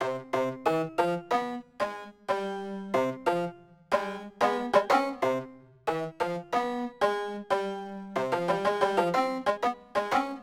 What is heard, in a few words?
Cmin, Hip Hop Exotica